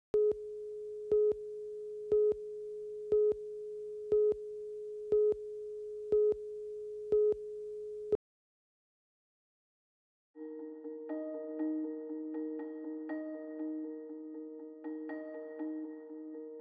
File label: Open Music; University Place